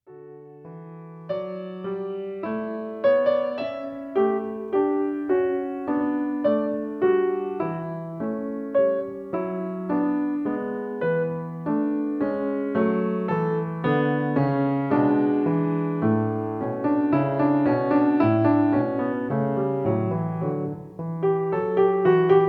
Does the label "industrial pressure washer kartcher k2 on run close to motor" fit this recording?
No